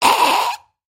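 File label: goblin sound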